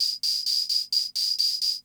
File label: CR78